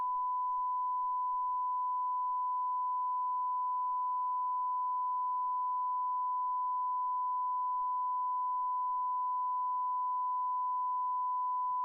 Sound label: Calibrator on BK2260